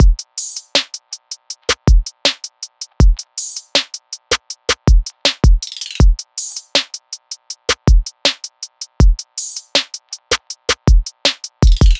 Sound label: Full Drum Loop 160 BPM; Cymatics - Astro Houston